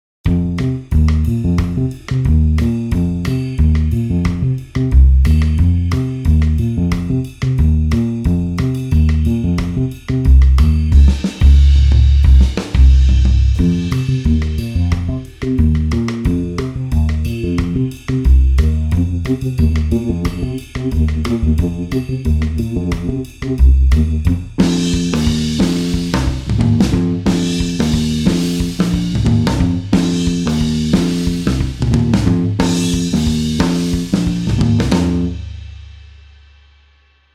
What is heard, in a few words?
electric piano bass; sampletank4; electromagnetik